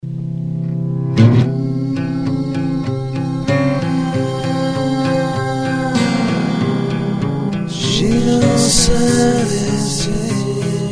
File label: acoustic